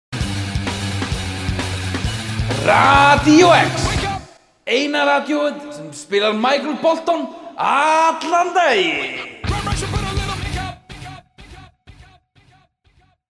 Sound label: jingle; Radió-X